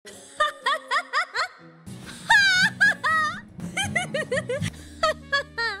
laught; agnes tachyon

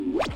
confirm; deadspace

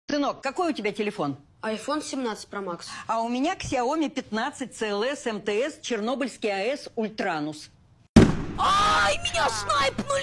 xiaomi

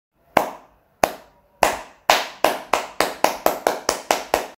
clap

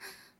ahh

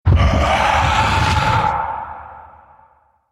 scream

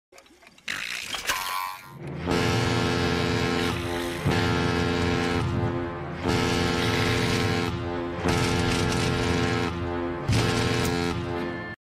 horn; spongebob

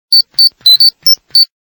communicator; power rangers

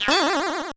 test cart error

